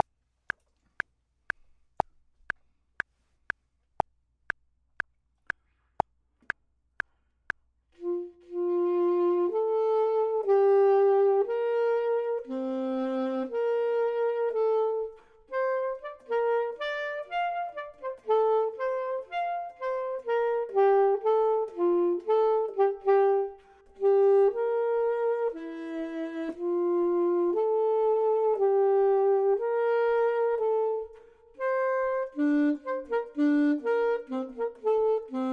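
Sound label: top, Saxophone and Clarinet; voxman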